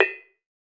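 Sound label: BONANG, Metalophones, Pitched Percussion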